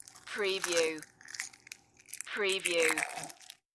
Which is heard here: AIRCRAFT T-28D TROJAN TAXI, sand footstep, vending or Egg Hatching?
Egg Hatching